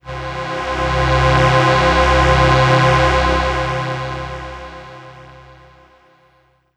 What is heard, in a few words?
Casio VZ 10M Space Lander C2